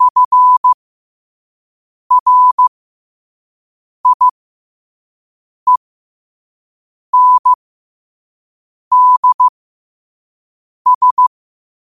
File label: 5 wpm, morse